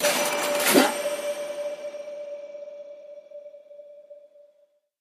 freeSpinStart; wolfcub

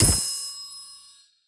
wild Land; wolfcub

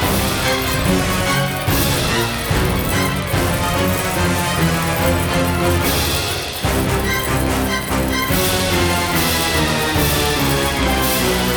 big win loop; the wolfs night

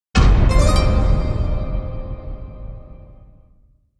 pot Jackpot; godsoffortune

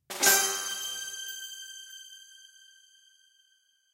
small win; irish pot luck

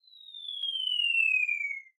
bomb Fall; reef raider

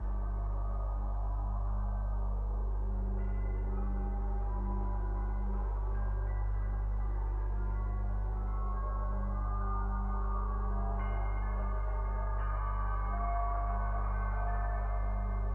ambience Music; temple of nudges

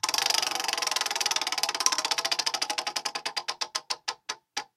jackpot Wheel Spin; irish pot luck

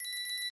count Up Loop; jack and beanstalk

